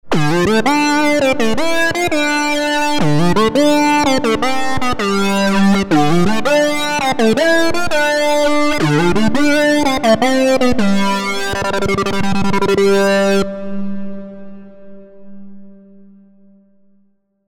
shining sync; obx

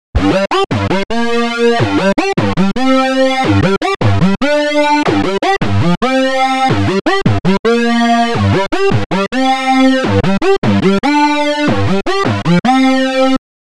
fat solo; obx; vst audio; opxvst

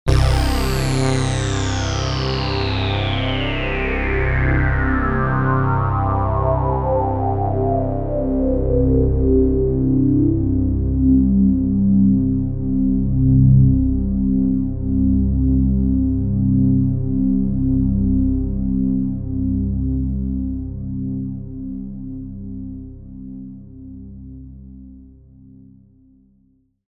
sem reso sweep opx

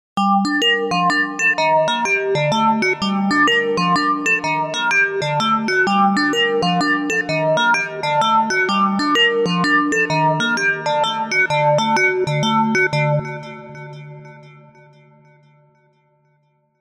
odyssey 24db; opxpro

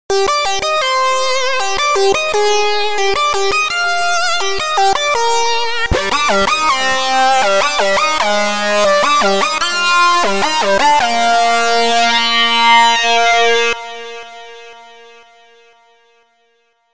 prophet solo; obx

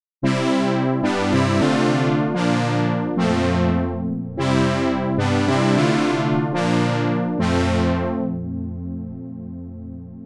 jupiter 8 bank; obx

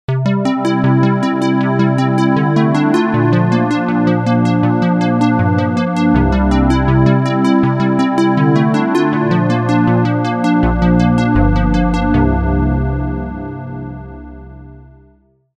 analog perc; obx

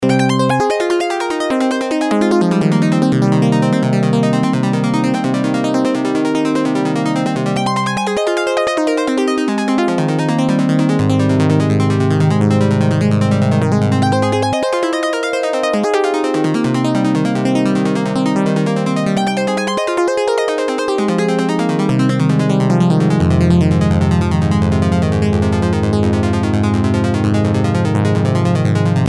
perc arpeggio

